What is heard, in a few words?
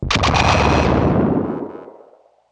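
teleport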